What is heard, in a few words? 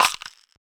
Neck Shaker Perc; Percs